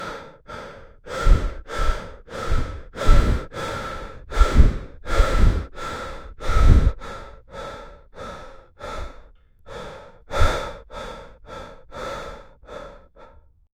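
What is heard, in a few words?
heavy breathing and running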